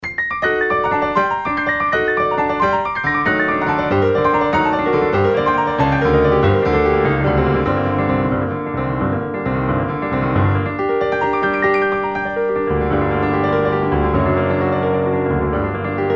large grand steinway; propellerheads; reason pianos

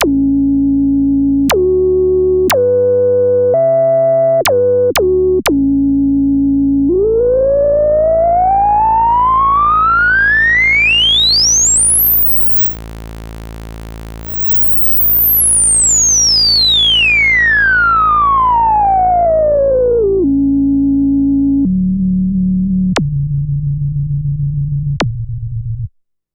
real moog filter; MiniMoog; gmedia minimonsta; ohmforce

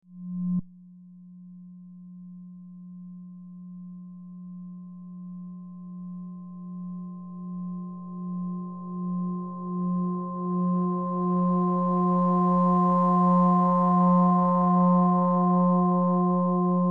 fm7 dry; native instruments; fm8

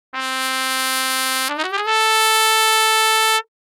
arabic, trumpet; samplemodeling

